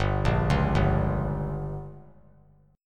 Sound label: G Diminished; arpeggiated; JGuitar